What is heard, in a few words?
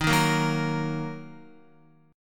D sharp Minor, Guitar; strummed; JGuitar